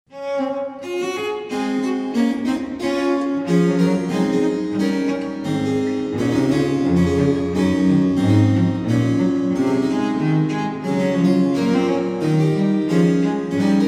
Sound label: pipa